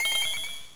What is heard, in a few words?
sparkle